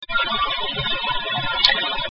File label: Whistle